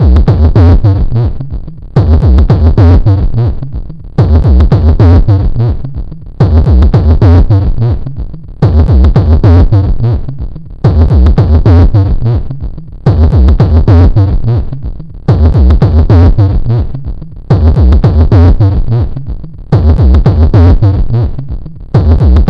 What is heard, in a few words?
drop drum lp